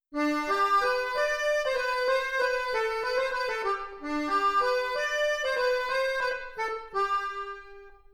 accordion tonality 4k, Harmonic structure not matched sounds harsh or metallic; Play Accordion Harmonics 4kHz